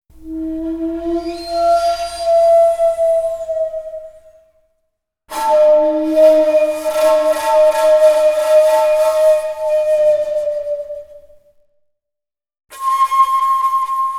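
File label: Shakuhachi Phrases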